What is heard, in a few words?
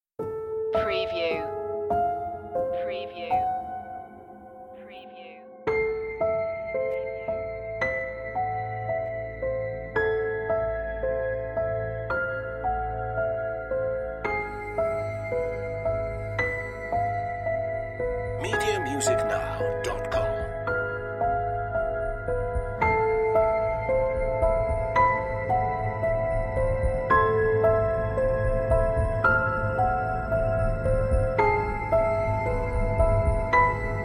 the paradox, horror